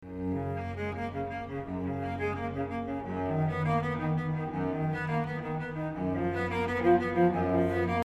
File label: Cello